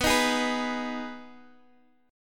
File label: Ab Minor B, chord